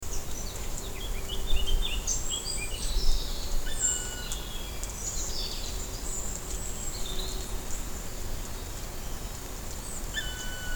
schwarzspecht anwesenheitsruf; Schwarzspecht Standortruf; Mag. Peter Holub

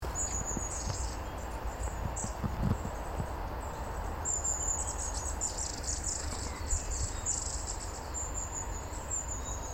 schwanzmeise; Mag. Peter Holub

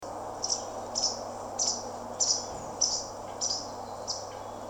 bachstelze; Mag. Peter Holub

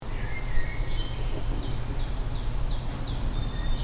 sommergoldhaenchen; Sommergoldhähnchen; Mag. Peter Holub

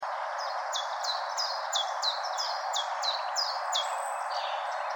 zilpzalp; Mag. Peter Holub

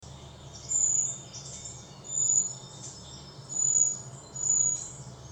waldbaumläufer; Mag. Peter Holub

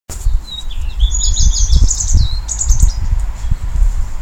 gartenrotschwanz; Mag. Peter Holub

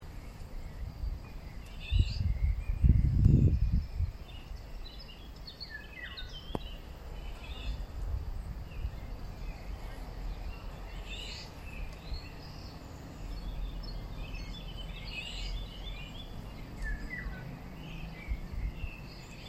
pirol; Mag. Peter Holub

